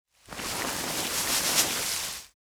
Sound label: Snake Desert Adder Movement